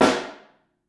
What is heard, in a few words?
Snare Room